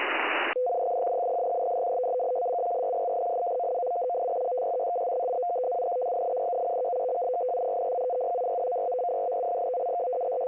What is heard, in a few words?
7840kHz, numbers stations